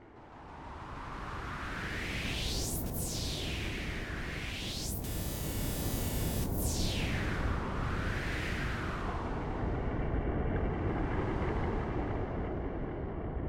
Wind Ambience; project exile prototype; gba

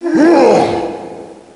Voices - PAIN; Tchernobog

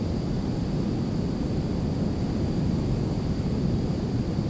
tools blowtorch loop; teardown; ps5 windows xbox series xs

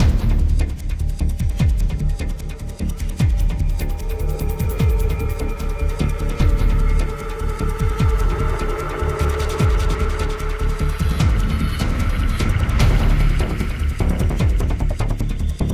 Combat Tactical dry Mix

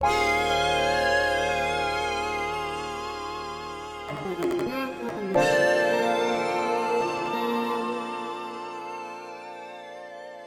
gameplay interstitial

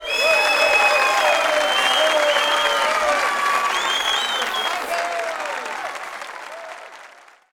Crowd Wild

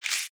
Pack Card Move

